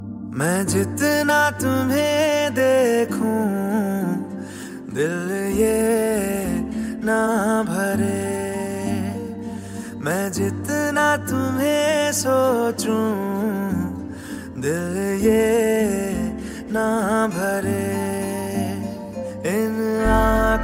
ringtone, bollywood ringtones

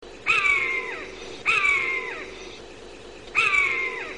flying bird short message tone; nokia ringtones

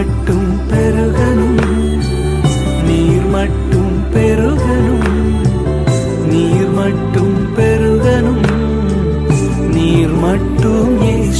tamil christian ringtone, christian gospel

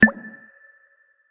unlock ringtone, samsung sms ringtones

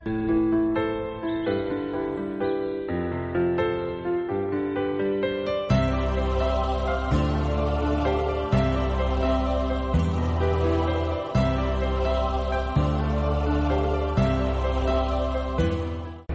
darling bgm ringtone; bollywood ringtones

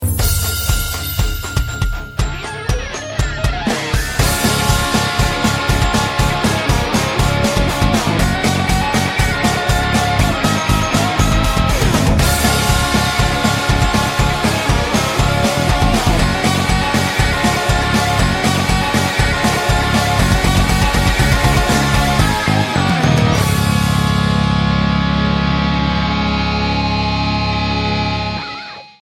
christmas rock ringtone

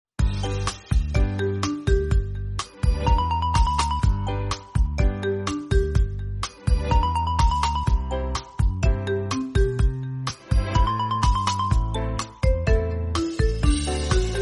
funny cartoonic music

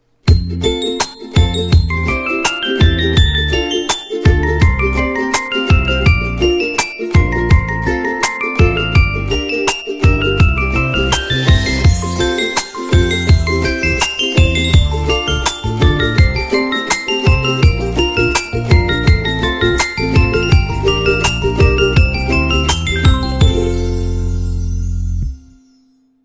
reggae ringtone

